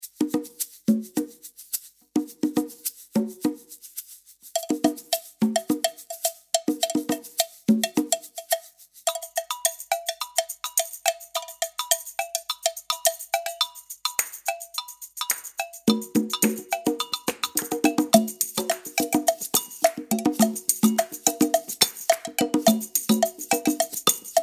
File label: Modern Disco; Modern Disco VOL.3